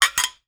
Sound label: Saucers Stacking, Kitchen